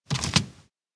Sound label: peek statue; anemoi